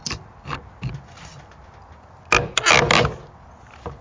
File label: nail puller